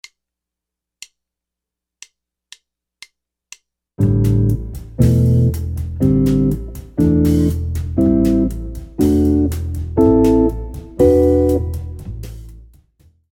Chords, jazz guitar

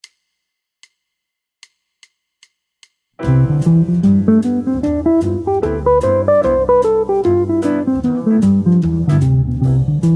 jazz guitar scales

